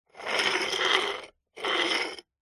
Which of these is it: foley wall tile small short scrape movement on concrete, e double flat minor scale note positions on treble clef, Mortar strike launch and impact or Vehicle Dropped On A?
foley wall tile small short scrape movement on concrete